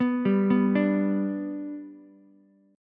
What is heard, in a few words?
G Major G down Ukulele; G arpeggiated; JGuitar